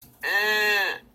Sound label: Whine